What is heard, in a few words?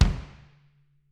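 bass drum; Bob Clearmountain Drums I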